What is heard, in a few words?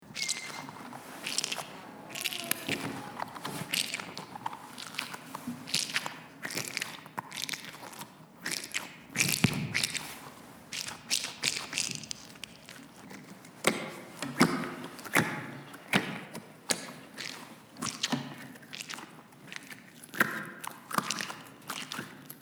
asmr